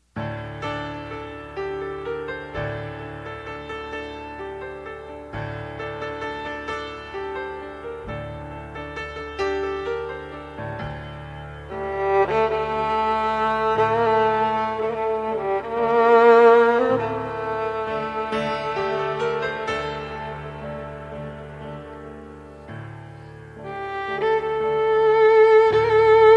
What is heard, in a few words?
Violin